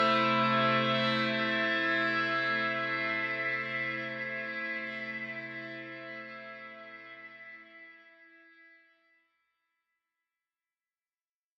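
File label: Guitar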